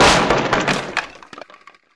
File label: wood, breakable; q3rally